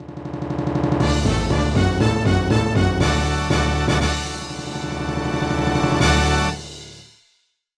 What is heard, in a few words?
Dance Finish Flourish; Garfield Gets Real; NDS